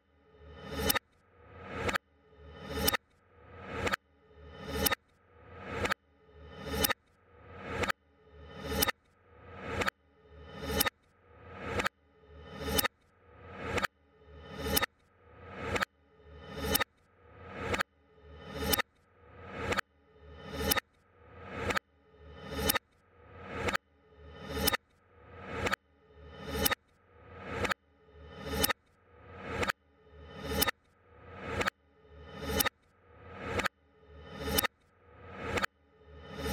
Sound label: tictac loop black revers